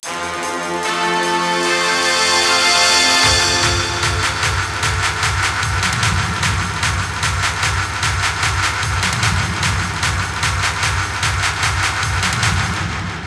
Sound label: Str G#